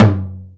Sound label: Low Tom; GMPercussion; 3DO; Toolkit 1.5; GMPercussion44k